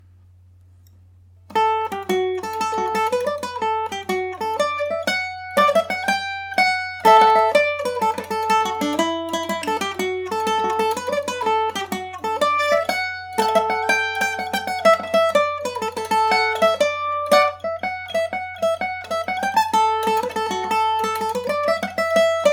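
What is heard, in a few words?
D major md304, irish mandolin; Eastman MD304 mandolin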